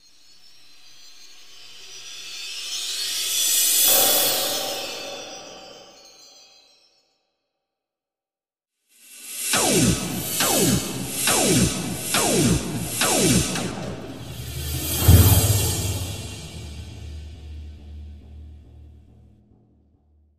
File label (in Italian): cartoon loghi